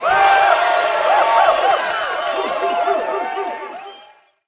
BMX crowd cheer; Crowds

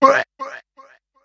Bandit Archer Death; Kilta

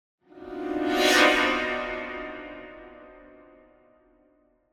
Symphonic Hit Short Show Up Chords

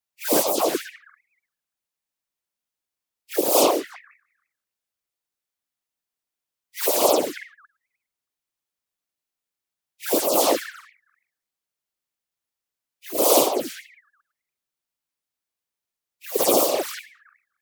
movement fast, shaped bandpass noise sweeps; boom library; Anime Essentials; DSGNMisc

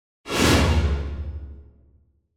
Symphonic Hit Battle Finale - Short; Hollywood Edge; sound designer's toolkit v2

